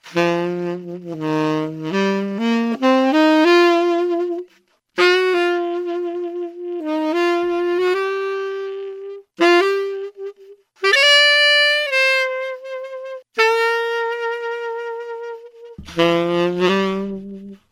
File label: sax intensity, Sad; Loops